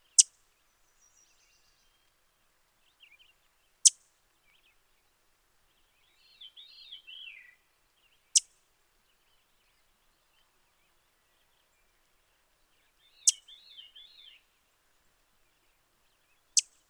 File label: golden warbler male, golden winged warbler